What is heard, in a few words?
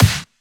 Snares; Vengeance - Essential Clubsounds Vol.4